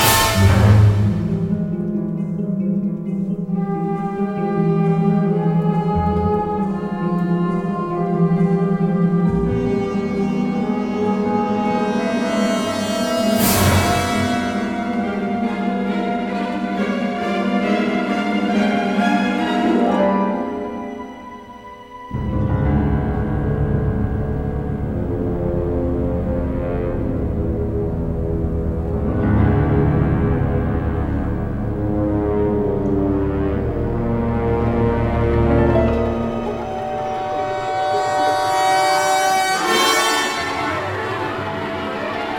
music hades; Hercules

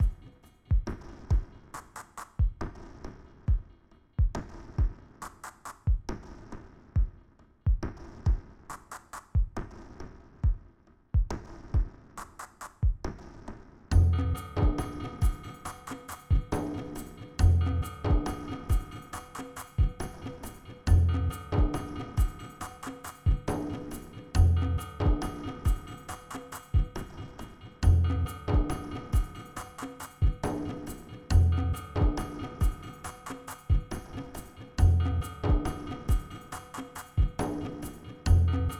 tabla

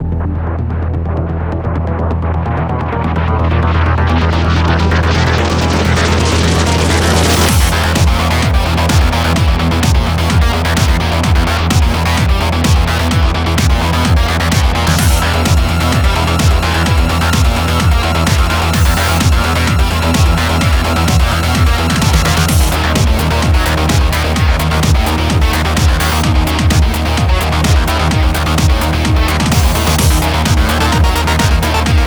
Boss Phase 2

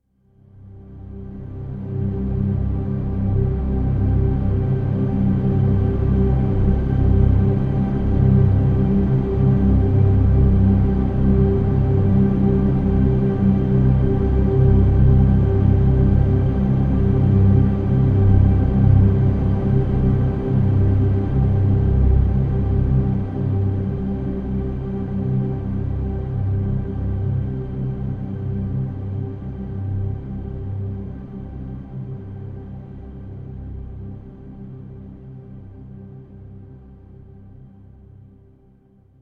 Stinger Exploration Drone

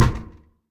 Knocking Single

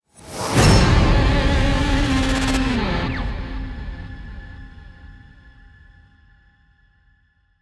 Fanfare Defeat; darwin project

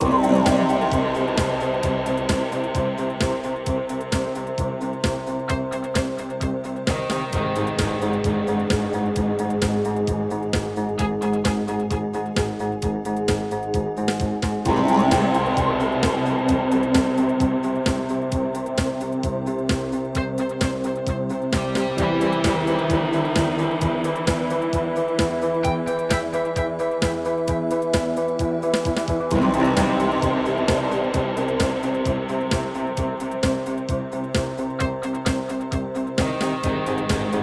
Rock; Ace Lightning

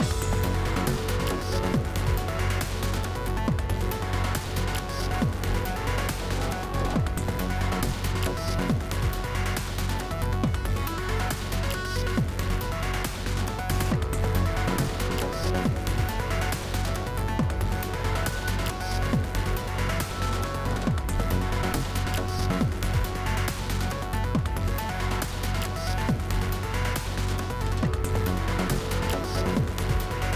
Combat, 138BPM; Anchor Act1

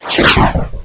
Fireshield

